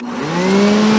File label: air suck start